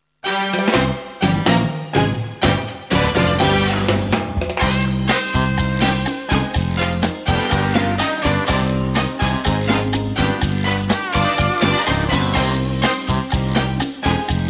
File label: Cha-cha